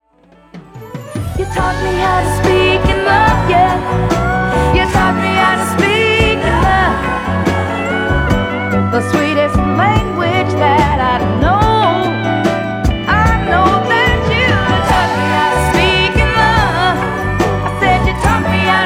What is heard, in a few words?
female sole stylus